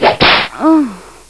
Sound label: Whip moan